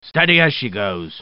Steady Tempo

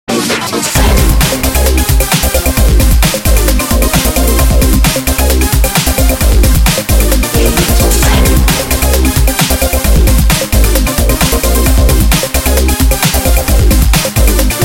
remix

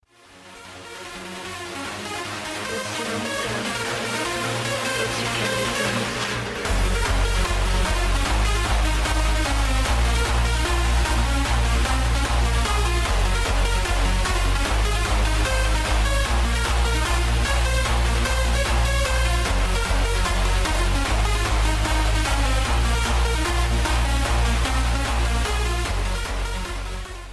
Sax Jump Remix

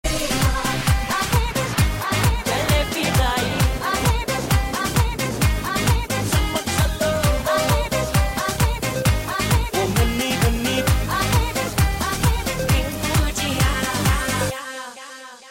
mashup, electronic ringtones

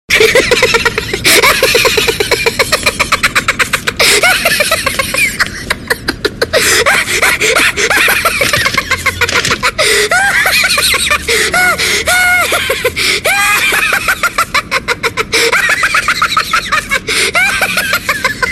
mad laugh; ringtones; vodafone

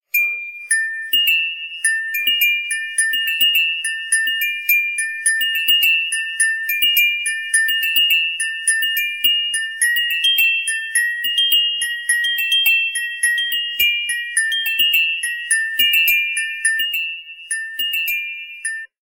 remix, electronic ringtones; vertu

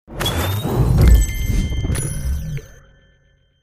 Loot

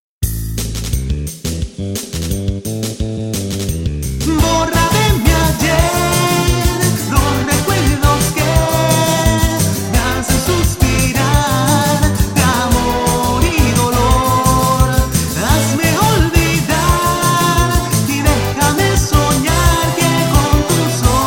Is Calling RNB

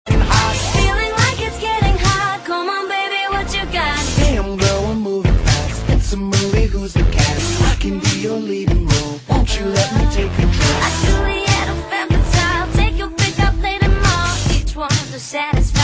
electro pop

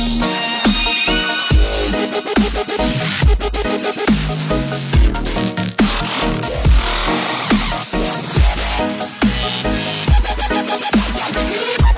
reggae

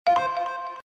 Mail Tone

